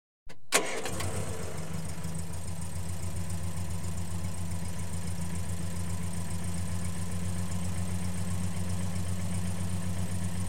ext- switch on, idle, switch off, Cars; BBC Sound Effects Library

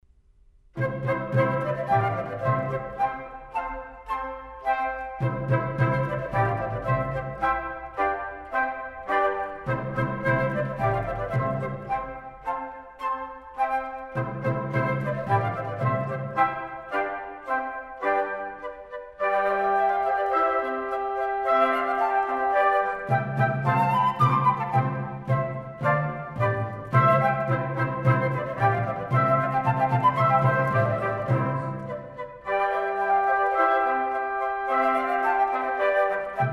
Divertimento in C Gavotte, Wind; Mozart; Serenades and Divertimenti for Wind

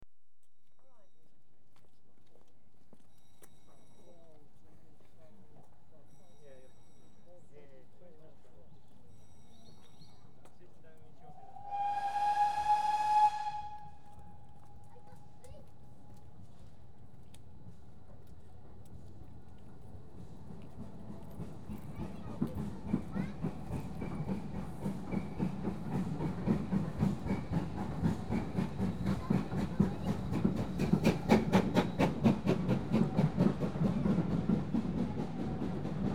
Train Through Station; BBC Sound Effects Library; Age Of Steam

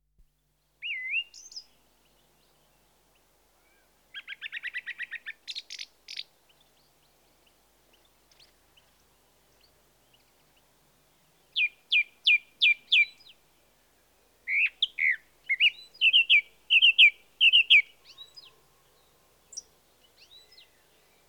Song Thrush (Turdus Philomelos) W; Birds; The BBC Sound Effects Library

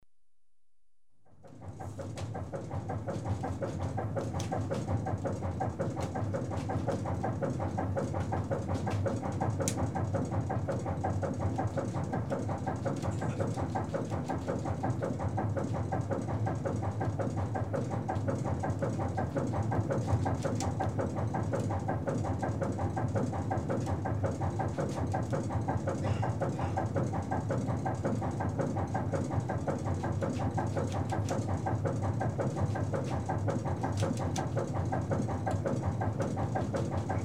Atmosphere below deck and under auxiliary engine; The BBC Sound Effects Library

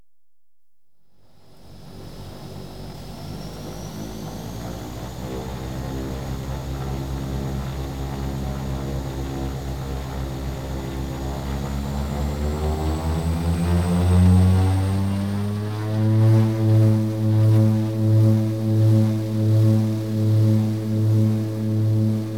TAKE OFF, CONSTANT FLIGHT, Aircraft; The BBC Sound Effects Library

